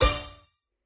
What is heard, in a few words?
scatter short; spinsane